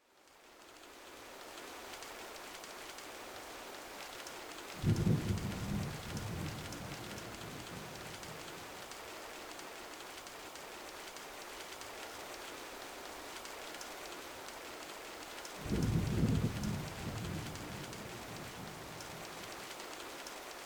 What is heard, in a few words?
rain thunder